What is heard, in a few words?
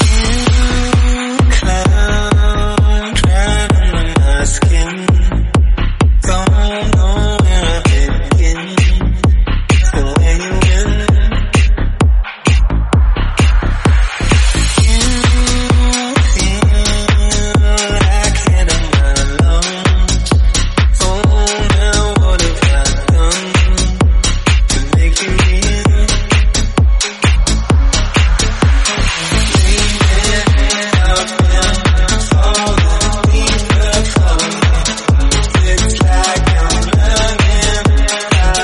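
130 bpm D flat minor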